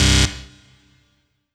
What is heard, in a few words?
HOUSE, House Dance